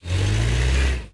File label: Forklift Car Moving; Subway Surfers City; Android, iOS